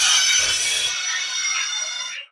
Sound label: jumpscare sound; fredbears pastaria